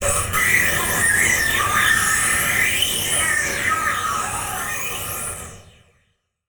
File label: Title Transition Communication Distortion; Production Element Title Transition